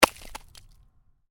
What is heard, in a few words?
Ice: medium chunk of ice lands on hard surface